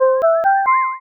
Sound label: victory